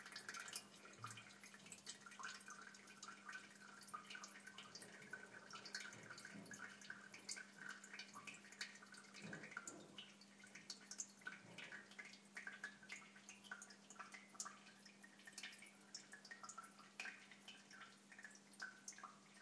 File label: 60 seconds of quiet dribbler